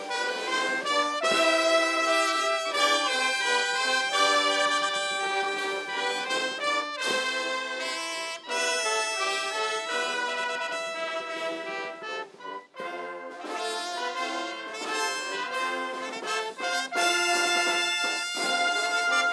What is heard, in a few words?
Russian National Anthem